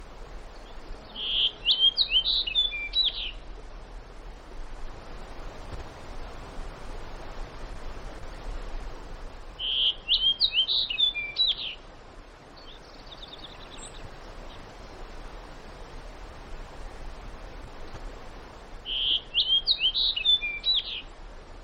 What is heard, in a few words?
Fox Sparrow Solo Song; alaska acoustic highlights; BIRDS